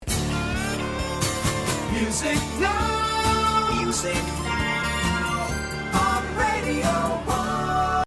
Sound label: Radio Music Now, Radio style ads intros sweeps & jingles